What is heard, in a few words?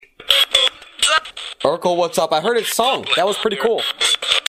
sung